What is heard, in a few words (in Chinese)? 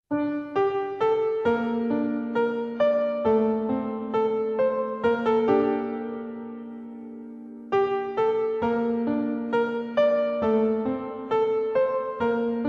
轻柔的背景音乐